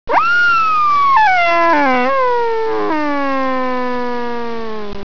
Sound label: dino roar